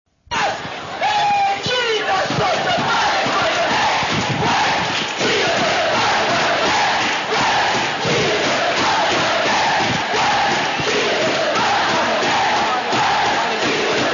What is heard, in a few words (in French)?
ambiance stade foot